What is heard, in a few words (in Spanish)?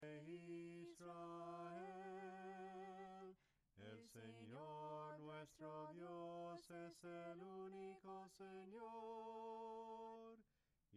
SOPRANO CANTADO, himnos en melodía soprano; cantos del camino